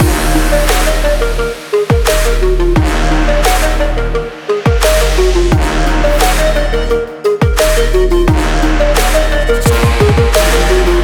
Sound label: extreme sport trap loop